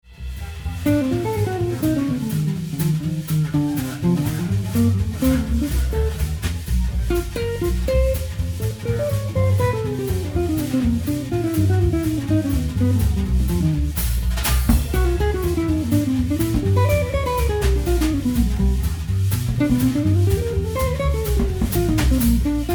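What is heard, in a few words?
Live Recording of 17" Archtop; Jay Carlson's 17" Archtop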